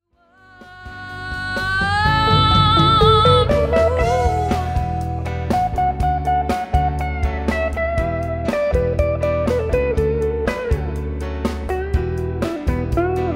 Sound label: guitar solo